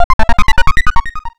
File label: strange psi